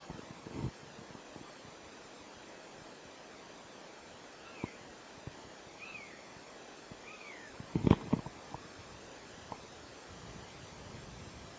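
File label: anger, honey buzzard; hexhamshire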